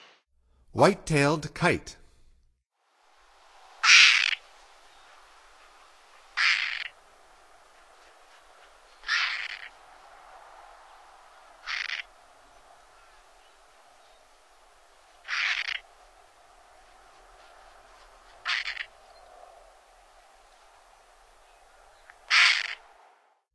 White tailed Kite; North America; Stokes

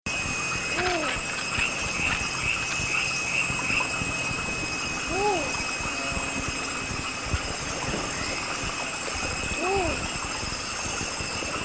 Least Bittern, S. America

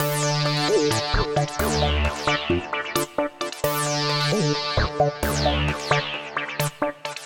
Lead Synth

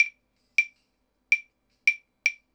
claves 80 bpm, latin

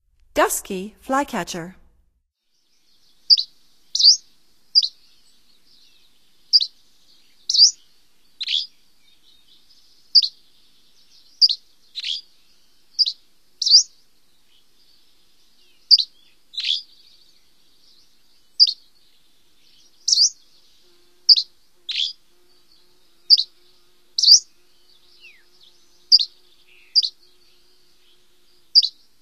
Dusky Flycatcher, Songs of Birds of North America; Stokes

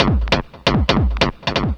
Drums Techno

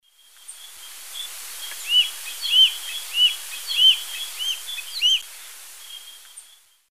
Elanoides forficatus, flight calls; S. America; Eastern Lowlands

